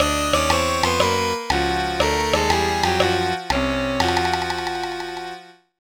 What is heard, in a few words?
Jingle Lost; Harvest Moon: Frantic Farming; NDS